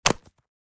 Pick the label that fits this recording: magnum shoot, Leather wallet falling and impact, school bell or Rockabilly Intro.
Leather wallet falling and impact